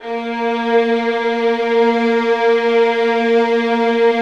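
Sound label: 1st violins sustain A#3; Sonatina Symphonic Orchestra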